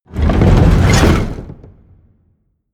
wood grind large; industrie wirtschaft; baugewerbe

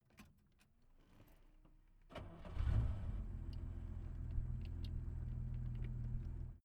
car jaguar e-type startup interior

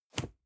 cardboard box medium place; objekte

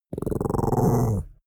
chihuahua growl; natur-tiere; hunde

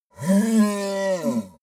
yeti call painful

